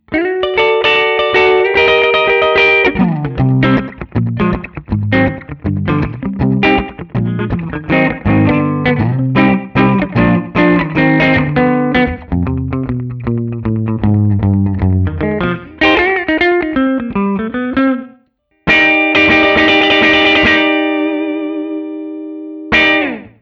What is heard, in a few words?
Guild Starfire III, Rockabilly; Westerly-made; Guild Starfire III from 1997